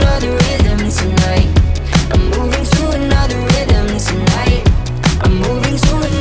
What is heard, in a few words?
Pop